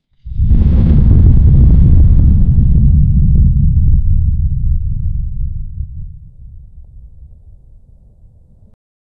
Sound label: cinematic lava, low growl; 10 sec